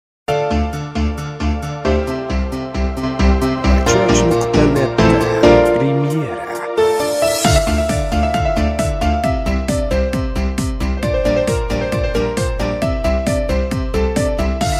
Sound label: piano tutorial